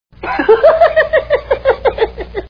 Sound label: riddler laugh; batman